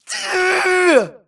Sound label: grunt electro; Titanfall 2